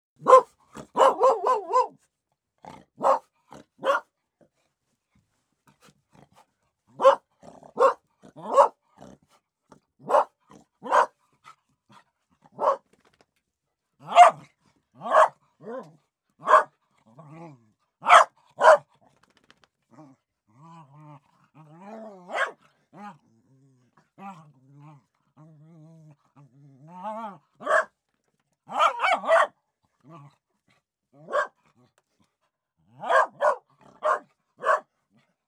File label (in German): Bellen